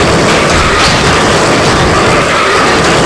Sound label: grind car; terrains; Tony Hawk's Project 8; XBOX